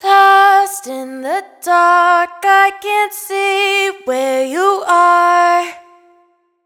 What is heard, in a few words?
hook Lost In The Dark 148 Fm wet, Vocal; Slate Digital Omnivox Vocal Sample Pack